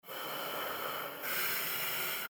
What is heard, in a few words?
breathing